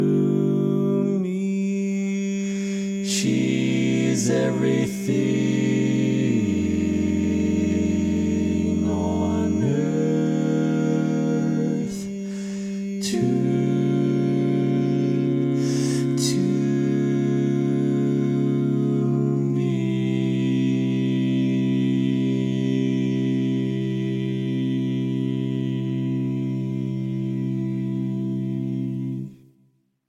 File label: barbershop tags, all parts